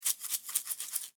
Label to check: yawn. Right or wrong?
Wrong